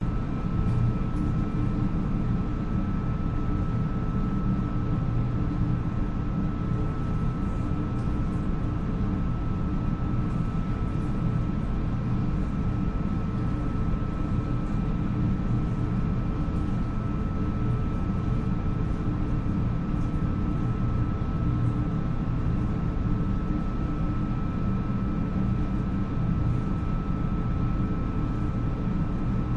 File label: canadian ferry washroom ambience; your free sounds